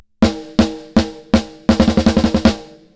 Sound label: SUB BASS DRIVE; COSMOS